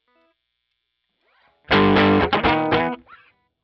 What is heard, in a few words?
Gtr